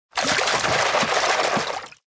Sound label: run splash; anemoi